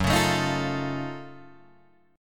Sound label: Gb Minor Major 13th Gb down Guitar DADGAD